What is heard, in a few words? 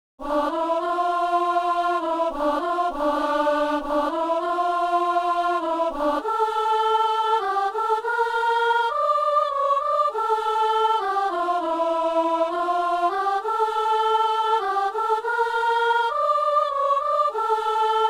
soprano; Messe du 17 janvier à Saint-Denis